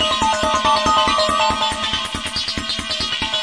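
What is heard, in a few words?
hardcore ambient, 140 bpm; REMIXABLE COLLECTIONS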